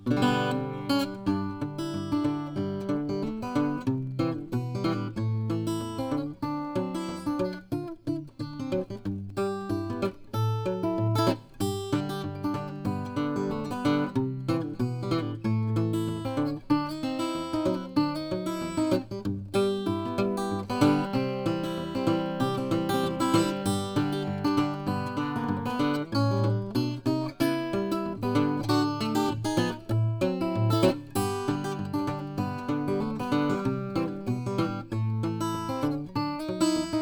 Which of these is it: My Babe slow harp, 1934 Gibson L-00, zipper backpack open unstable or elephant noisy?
1934 Gibson L-00